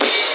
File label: Closed Hats